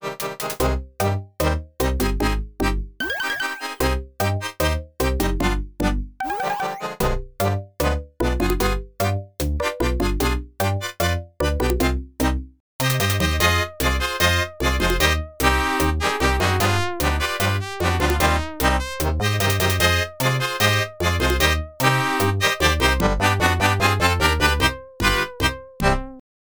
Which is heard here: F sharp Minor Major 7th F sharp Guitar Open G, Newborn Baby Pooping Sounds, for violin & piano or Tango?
Tango